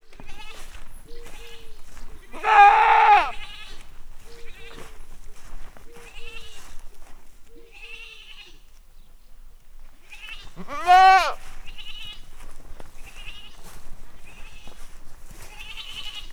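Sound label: goat, human footsteps; állathangok; paraszt udvar; házi kecske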